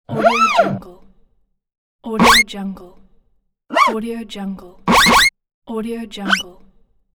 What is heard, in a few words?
sliding guitar string